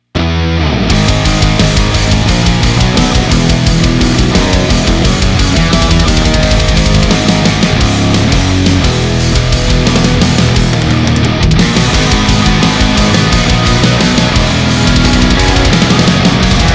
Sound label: Hard Rock Remix